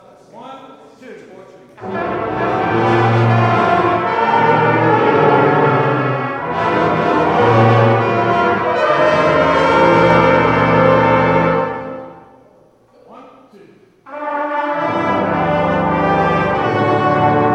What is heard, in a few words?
Happy Birthday Run Through, brass practice; woburn ma